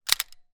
weapon gun walther ppq; still north media

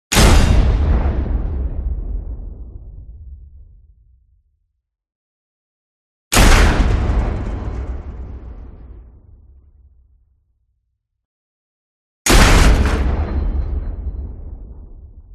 CINEMATIC IMPACT Hit Metal; smartsound

